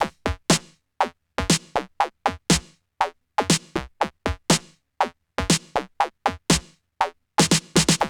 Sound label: GHET ROL; Best Service; Advanced Media Trax Building Blocks; GHET ROLL